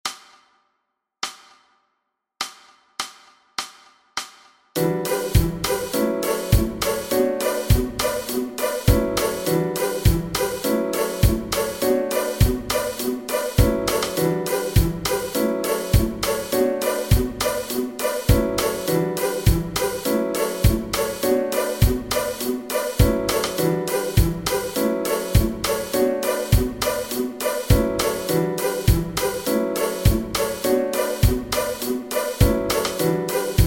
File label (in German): ohne Bass